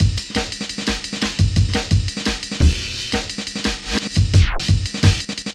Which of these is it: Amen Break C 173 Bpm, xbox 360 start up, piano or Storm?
Amen Break C 173 Bpm